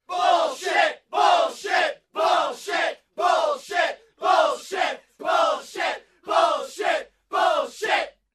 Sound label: Crowd chanting and cursing